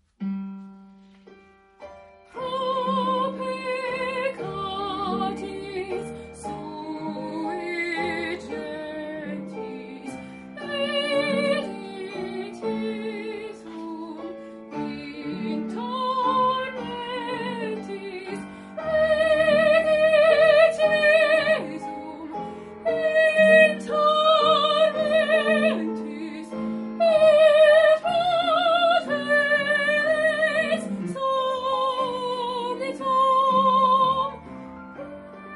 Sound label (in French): soprane; stabat mater; Grandval n4